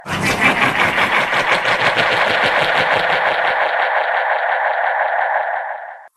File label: announcer chuckle